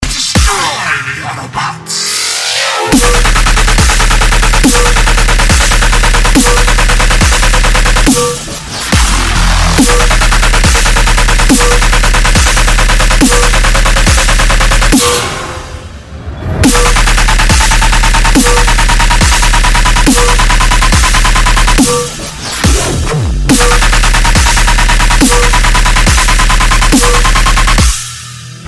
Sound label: ringtone